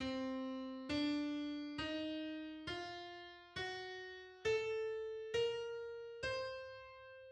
Blues scale heptatonic C

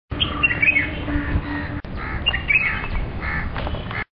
Bulbul sings

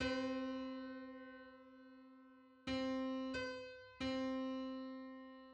Thirty first harmonic on C; MIDI

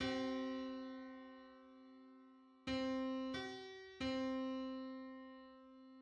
Ninety third harmonic on C; MIDI

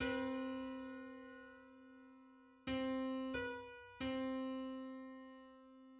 Sixty-ninth subharmonic on C

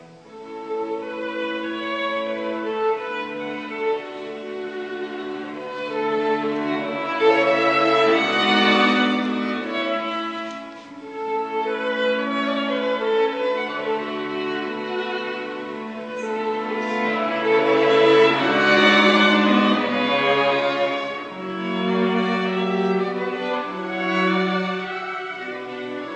Dvorak American Quartet 1st Movement second theme

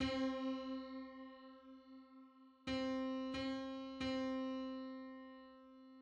Septimal comma on C

